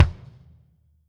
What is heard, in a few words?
Drum Set